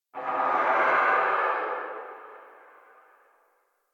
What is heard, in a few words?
Tree Distant Critters Angry; Ambience